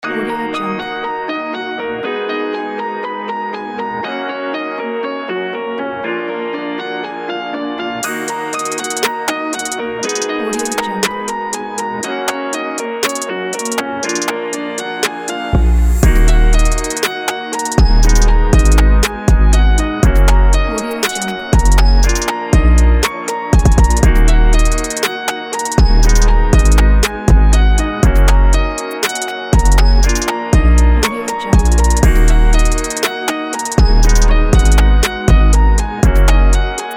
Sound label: modern trap beat